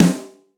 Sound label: hot acoustic snare sound f key; snare drum samples